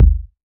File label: BD, Waldorf Blofeld